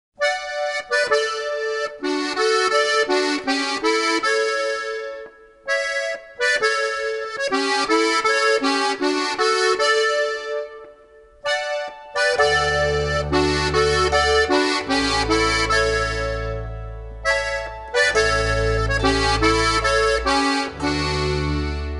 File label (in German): Volksmusik